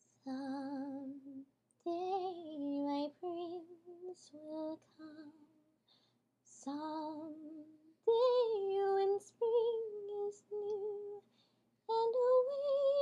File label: acappella